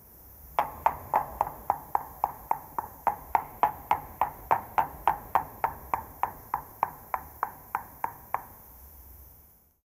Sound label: hooves clattering in the